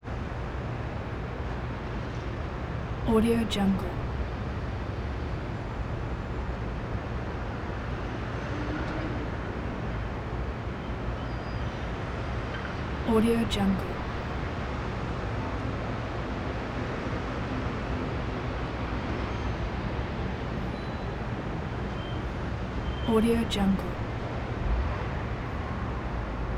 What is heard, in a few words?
san francisco downtown distant traffic roar